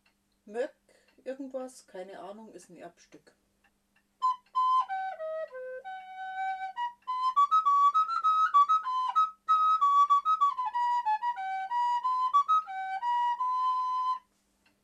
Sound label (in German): moeck, floeten